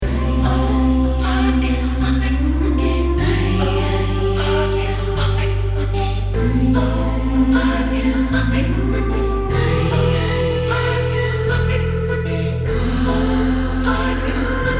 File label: Healing Melody